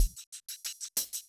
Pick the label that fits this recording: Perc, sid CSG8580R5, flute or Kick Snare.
Perc